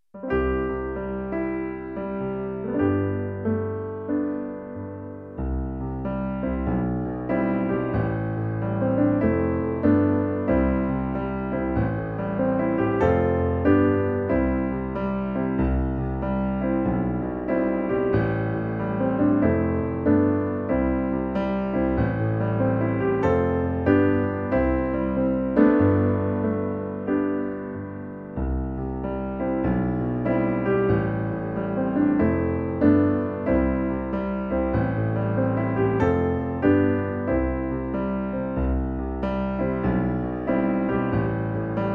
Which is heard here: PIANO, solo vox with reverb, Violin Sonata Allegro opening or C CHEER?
PIANO